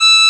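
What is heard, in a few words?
E6 hard straight, oboe; Northstar - New Gold VOL-2; EBONY OBOE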